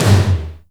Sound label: TOM, Drum Dry; East Collexion; Drum 1